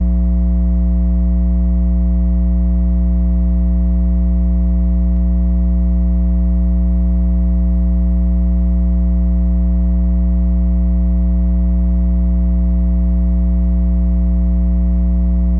Small 60's Organ

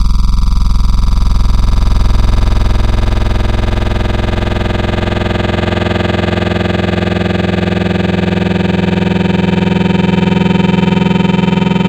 Digital Taurus; ESW Digital; Synthetic's Serum Collection; ESW Core Wavetables; Echo Sound Works Core Tables

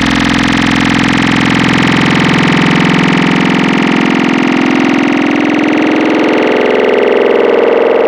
Growl Table; Synthetic's Serum Collection; Cymatics - Growl Tables

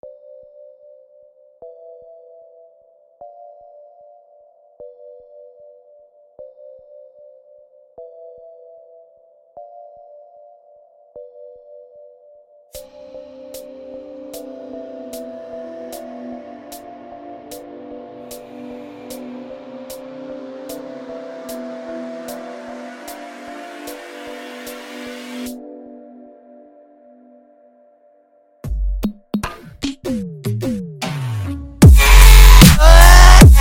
screamstep; lolicore

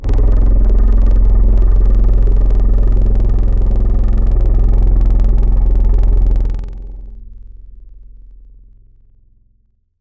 Vocal - Chops; Wavetables; Chops for Days; Synthetic's Serum Collection; ESW Core Wavetables; Echo Sound Works Core Tables

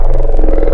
Monster; Synthetic's Serum Collection

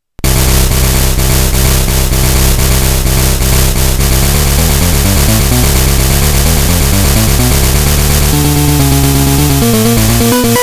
NOISE; lolicore

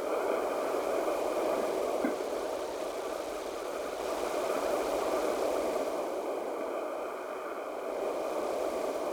Machine Noise; ESW Ear Candy Noises

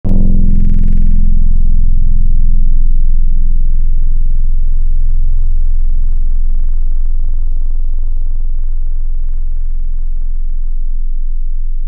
Little Harp; Synthetic's Serum Collection; Echo Sound Works Sphere

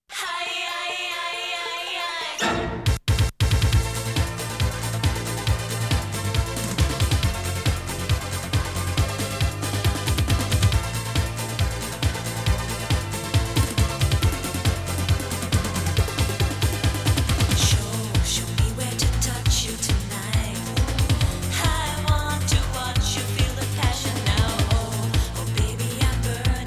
With Japanese Voiceover

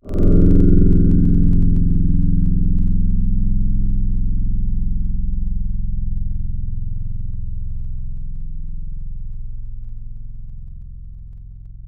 Kora; Synthetic's Serum Collection; Echo Sound Works Sphere

